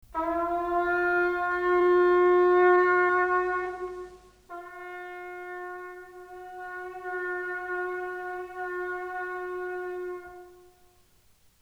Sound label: Shofar Call; Ben-Hur（宾虚.1959）; 外文宗教歌曲